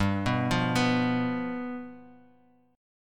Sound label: G 7th G down Guitar Standard; G7 arpeggiated; JGuitar